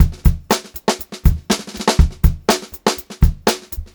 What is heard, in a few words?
121 FK BEAT R, Funk-Groove Drumloops; USB Soundscan vol.38; AKAI; FUNKY